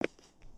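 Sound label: Foot Concrete Sneaker Walk, footsteps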